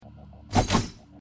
sword; risk of rain 2

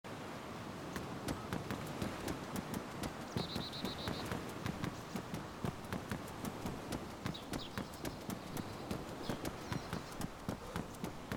running asphalt; dayz standalone

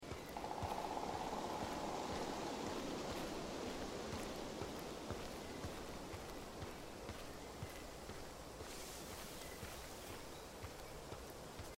walking forest morning camp hintergrund; Life is Strange 2; gamesoundopedia

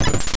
hitting metal target; castlevania

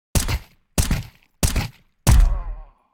distant kill; counter strike 2

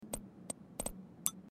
ipad code motel hintergrund; Life is Strange 2; gamesoundopedia

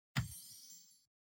spell prepare; baldursgate

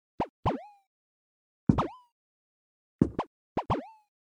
supersneakers active; Subway Surfers

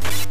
enemy vase; kung fu master

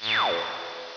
close menu; mgs

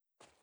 character landen; battleblock theater

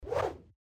sword swing; baldursgate

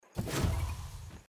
heal; hyper light drifter

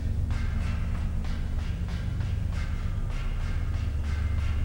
metal; limbo; perception

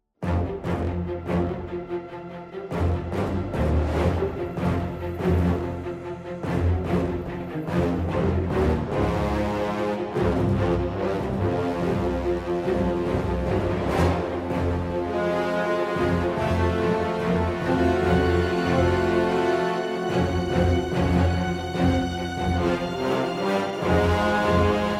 the void soundtrack; divinity original sin ii

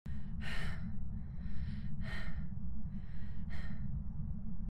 out of breath; Phasmophobia; gamesoundopedia

